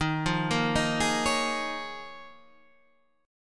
D sharp 7th Suspended 2nd Suspended 4th, Guitar, Half Step Down